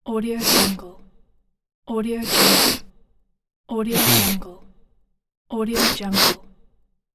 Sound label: sniffing nose